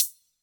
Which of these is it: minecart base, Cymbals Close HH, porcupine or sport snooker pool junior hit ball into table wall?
Cymbals Close HH